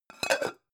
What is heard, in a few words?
pan close